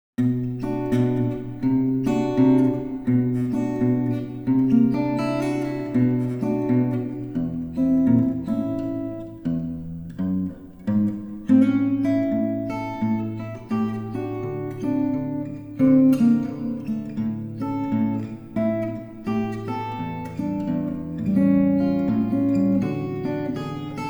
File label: blues en la